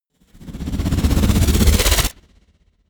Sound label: whoosh flutter